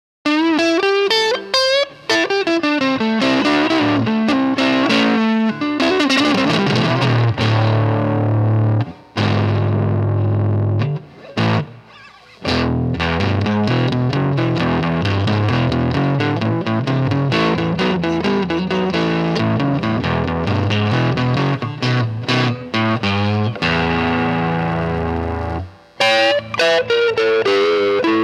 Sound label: Tele Distortion, Allen Chihuahua Amp